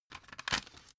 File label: cd cover open